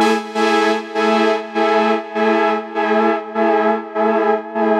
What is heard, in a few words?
Pad 100 A, sidechained